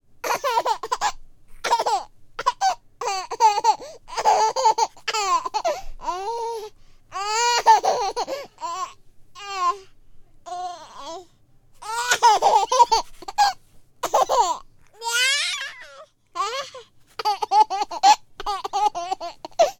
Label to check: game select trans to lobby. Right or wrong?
Wrong